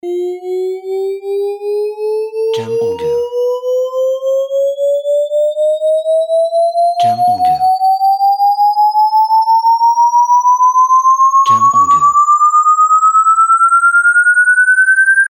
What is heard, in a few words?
Rising